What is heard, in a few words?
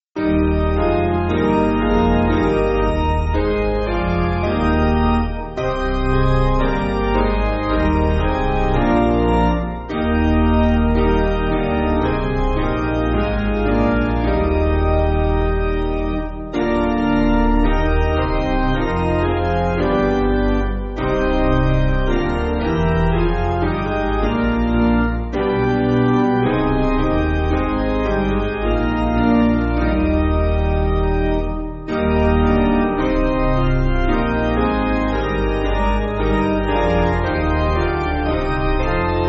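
Piano, Music Backing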